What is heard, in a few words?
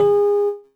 piano ab; school; Tony Hawk's Project 8; XBOX; inschool